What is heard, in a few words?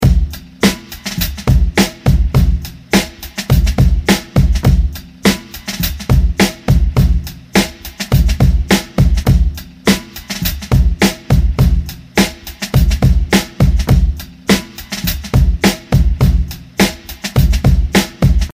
Beat Study Groove Live